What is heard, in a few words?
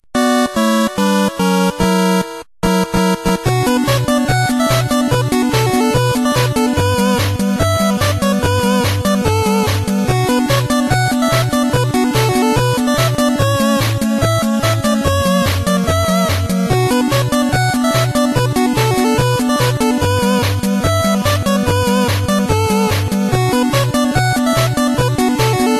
Temple Music; timothy and the mysterious forest ps4 switch windows gamerip 2019